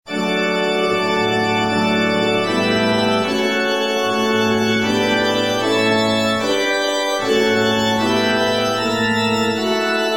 Organ; When I Survey

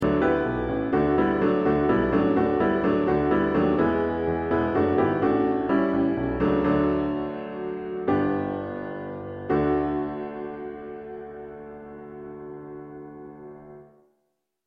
Piano; What A Friend

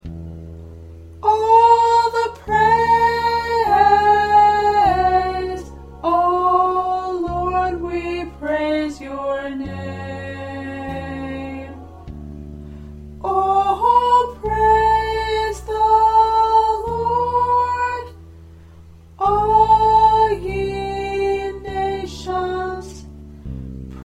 P Worship Medley Alto; practice tracks

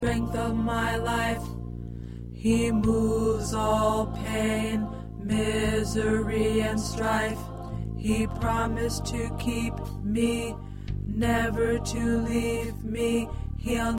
Tenor

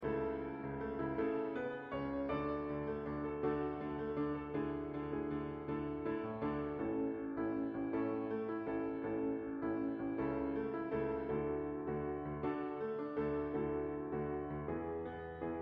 Piano

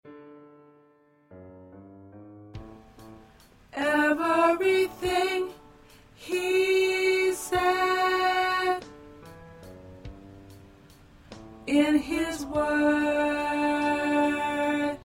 Alto, gospel songs; practice tracks

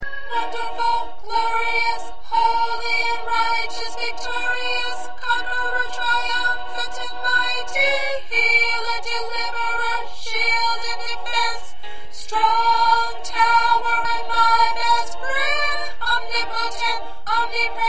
Soprano

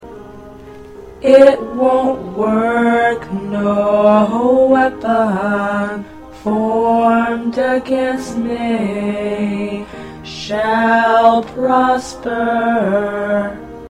Tenor

